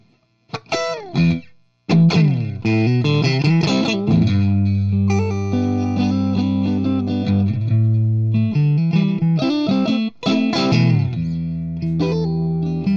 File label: JCM800